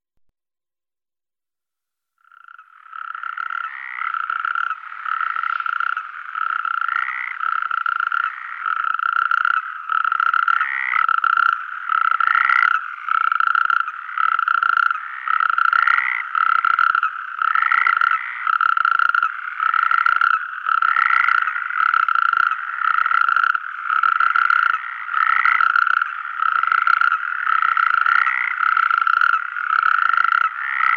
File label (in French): crapaud calamite